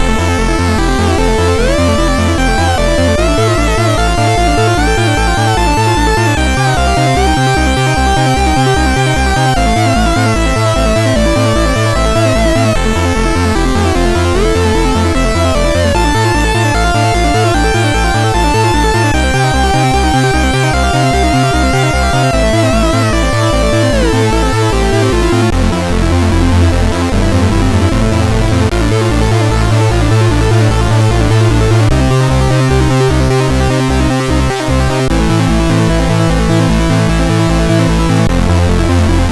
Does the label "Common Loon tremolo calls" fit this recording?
No